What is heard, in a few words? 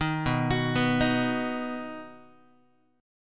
B Major B, Guitar; B arpeggiated; 4A,4E,5A,5D,5G#,6C#; chord shape; 6,7,9,9,7,x; JGuitar